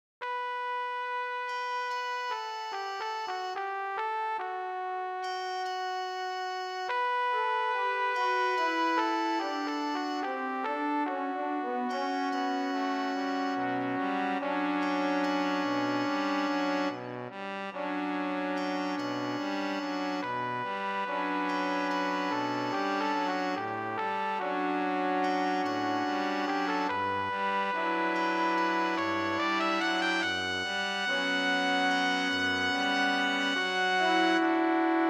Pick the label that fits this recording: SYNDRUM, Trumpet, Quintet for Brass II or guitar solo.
Quintet for Brass II